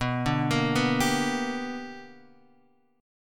B Minor Major 7th Sharp 5th, Guitar, Drop D

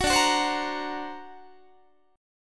D Augmented 7th D down Guitar; D+7 strummed; JGuitar

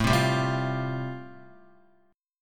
A-Minor 7th Sharp 5th, Guitar, chord; Am7#5 strummed; JGuitar

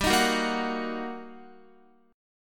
G Minor Major 7th Sharp 5th, down, Guitar; GmM7#5; strummed

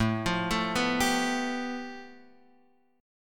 A 7th Suspended 2nd Sharp 5th A, Guitar Open F; arpeggiated

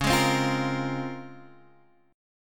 C sharp Major 13th C sharp, Guitar Half Step Down

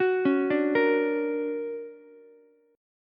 D Augmented Major 7th, down, Ukulele; D+M7 arpeggiated; JGuitar

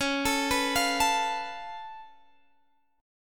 Db Augmented 7th Db Guitar Drop D

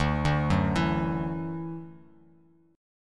D-Major Add 9th D down Bass Standard; arpeggiated; JGuitar

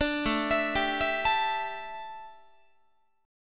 A 7th Suspended 4th, A, down, Guitar; A7sus4 arpeggiated

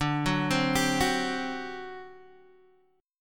D 11th D, down, Guitar, DADGAD

